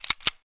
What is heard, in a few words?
reload mag click; minecraft